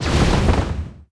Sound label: Clod shell